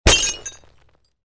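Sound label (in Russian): пуля по бутылке с осколками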